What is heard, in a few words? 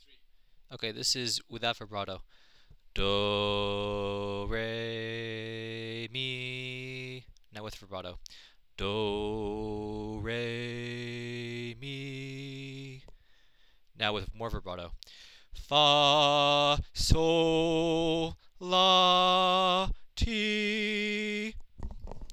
vibrato